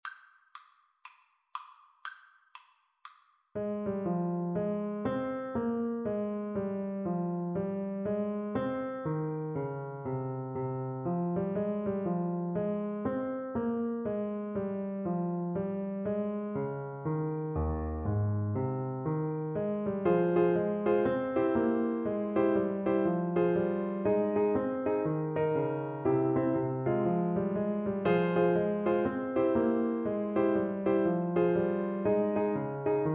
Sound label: bach bouree alto recorder